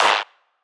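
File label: Clap G minor Analog Layered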